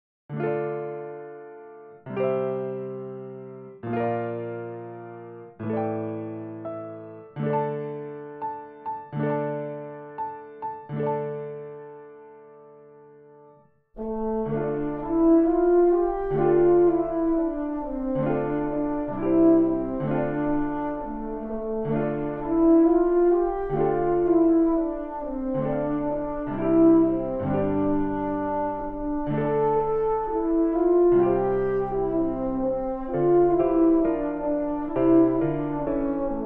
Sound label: The Huron Carol French horn and piano; christmas music and carols